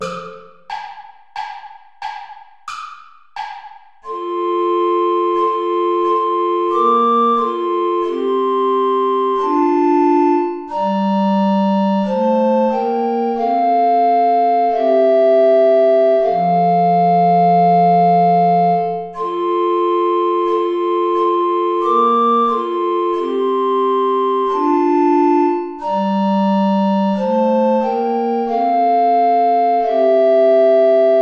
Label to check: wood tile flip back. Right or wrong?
Wrong